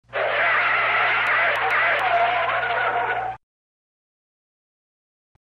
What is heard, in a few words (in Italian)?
frenata d'auto